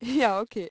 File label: laugh; The Phonetics of Laughter